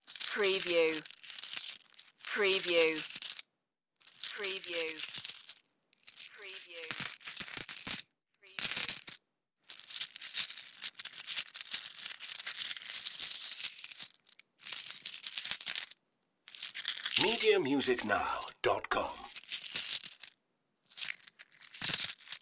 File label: handling coins